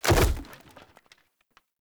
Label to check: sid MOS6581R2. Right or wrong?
Wrong